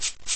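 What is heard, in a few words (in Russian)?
шейкер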